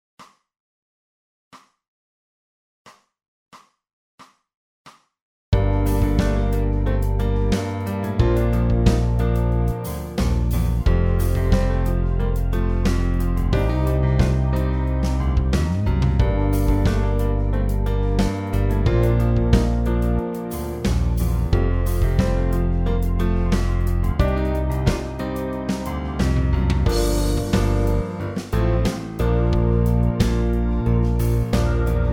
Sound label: Funk n Soul Song, piano